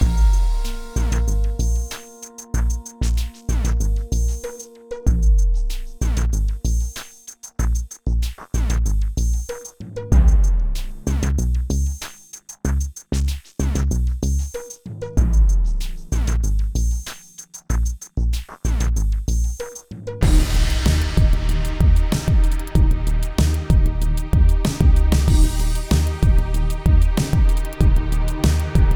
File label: 95bpm No lead Loop; gamerip; Bakugan: Champions of Vestroia